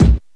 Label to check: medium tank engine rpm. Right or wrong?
Wrong